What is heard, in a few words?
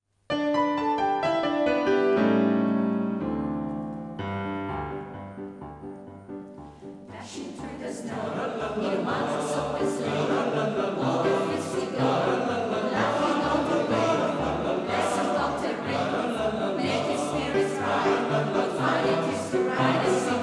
Jingle bells